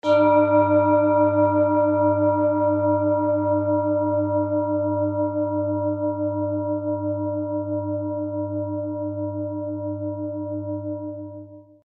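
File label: tibetan bowl; uranussaturn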